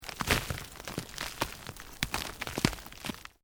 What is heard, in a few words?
EARTH LOOSE MOVE LONG, Debris